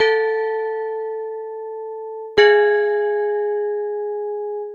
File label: Pelog Unison; Melodic; Ambient-Melodics-II